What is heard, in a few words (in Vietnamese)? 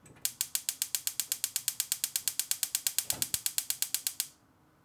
Tiếng bật bếp ga không lên